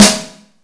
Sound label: wet snare drum sound g sharp key; snare drum samples